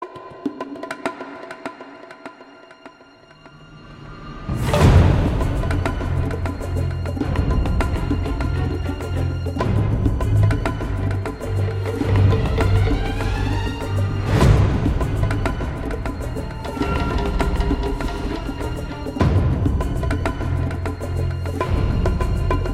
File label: music Jungle Battle